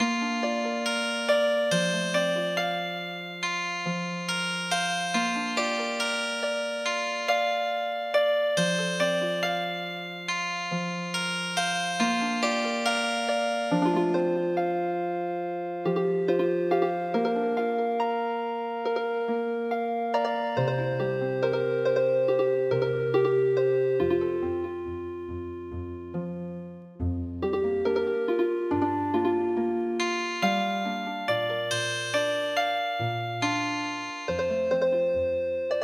Healing Harp

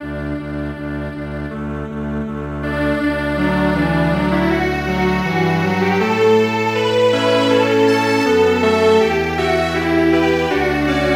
Strings Quartet

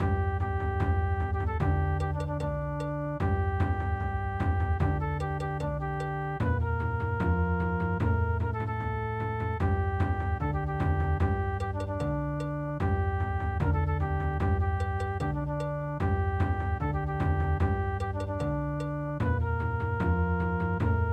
Bon Odori; Iuccia Paighi

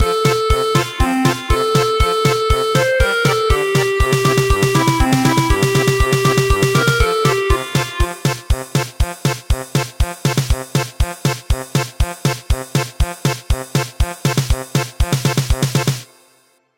German Polka

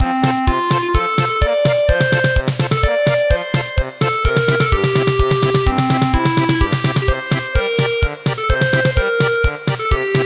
German Polka

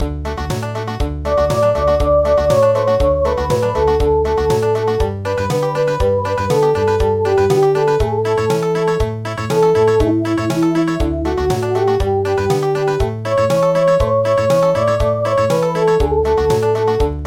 Country, midi

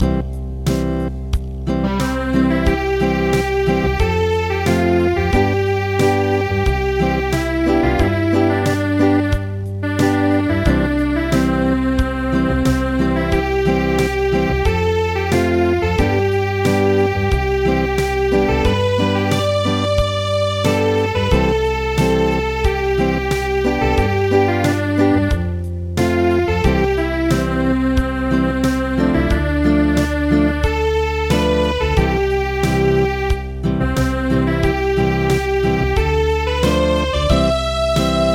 Acoustic; Thai National Anthem

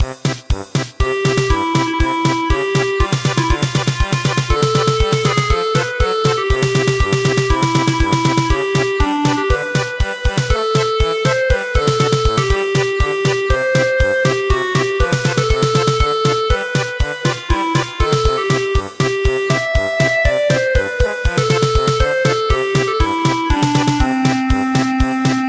German Polka

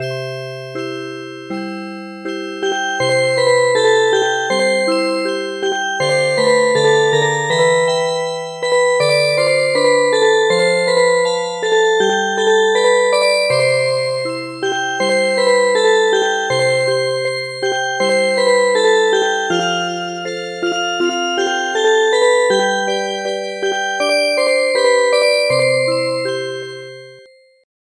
Music Box; The wooden clogs

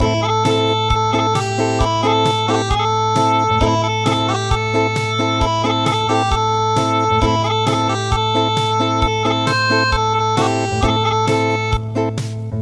Acoustic

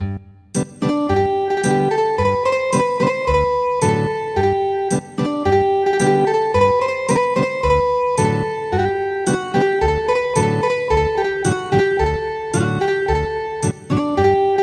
Ethnic Guitar; The dance of barley

